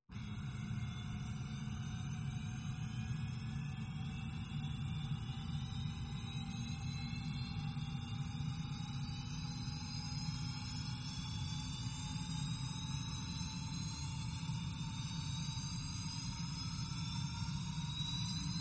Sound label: airplane